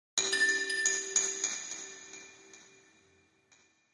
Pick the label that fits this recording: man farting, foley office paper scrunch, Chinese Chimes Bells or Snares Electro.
Chinese Chimes Bells